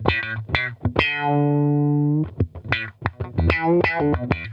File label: Funk Guitar; Zero G - Funk Guitar